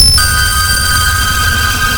sonar Ping Water Very Close Beacon; Barotrauma; Real Sonar

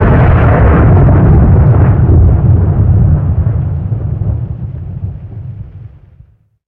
Piezo crystal far; Materials; Barotraumatic

